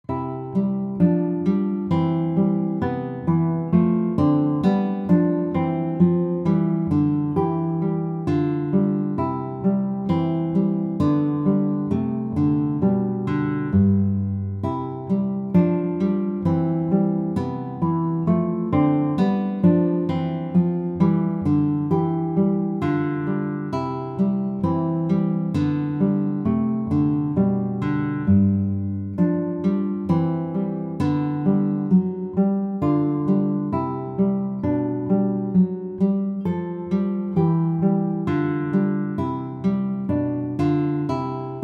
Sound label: melody